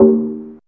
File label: Fairlight IIx CMI Tabla, Drum Machines; 300 Drum Machines